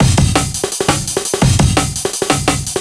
Break FX 170

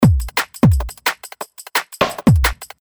Breakbeat 174

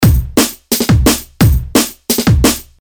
Break 174; BANG

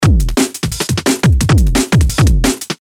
Neuro Break 174

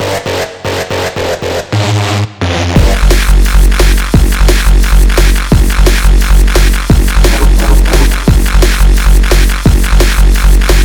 NuSkool DnB Drumloop Elements; BHK Samples

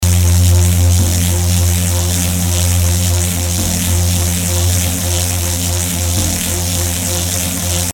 Reese

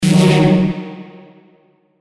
Lifted Stab Hit F

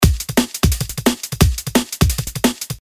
Breakbeat 174

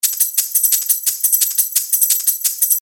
Shakers 174

